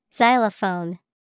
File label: Xylophone